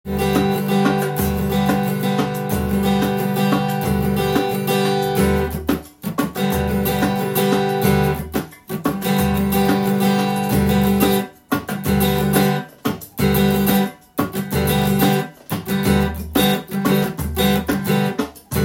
percussion and rhythm section

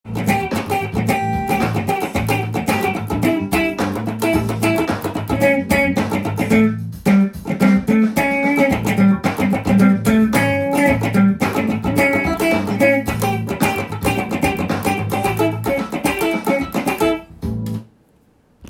chord tone cutting solo, guitar

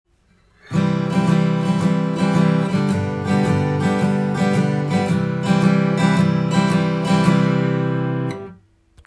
guitar

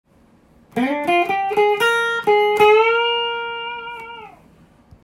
minor major penta, guitar

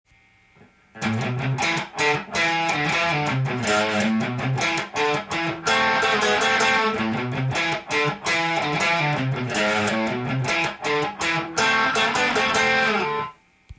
riff, guitar; how to create guitar riffs; musictheory

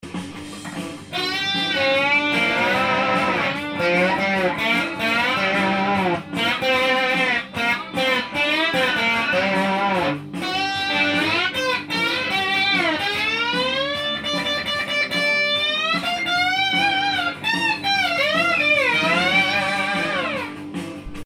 rocknroll solo

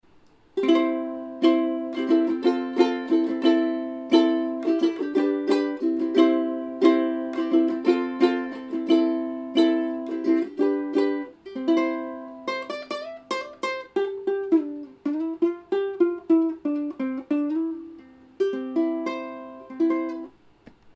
ukulele

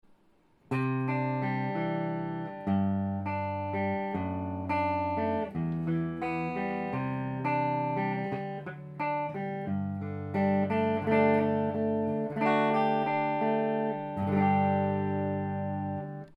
yamaha revstar

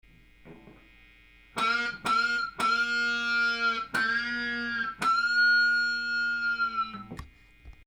picking, guitar

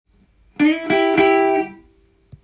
blues, guitar; blues short phrases

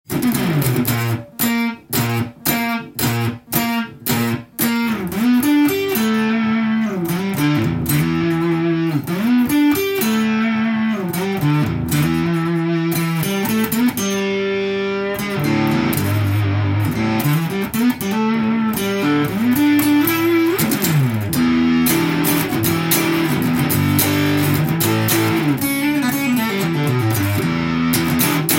fuzz face; fuzzface ufo84 roverfuzz

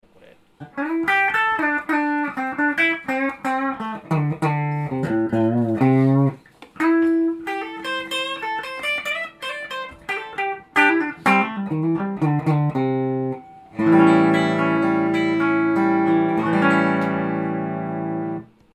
pick

